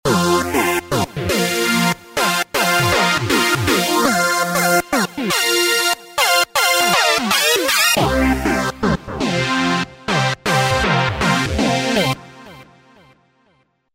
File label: Roland V-Synth; Weightatcha; Factory Patches